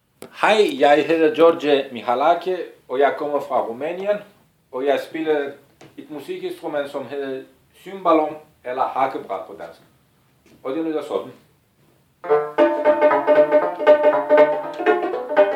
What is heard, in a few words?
cymbalom; prinscalibutsrejse